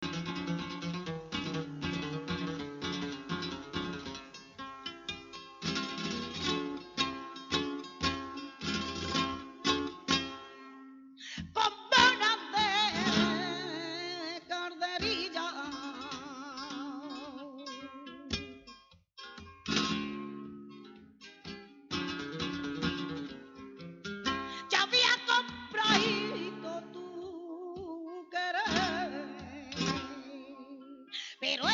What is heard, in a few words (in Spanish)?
Solea